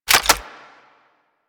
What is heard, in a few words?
Sniper Rifle Reload; Warframe; Tenno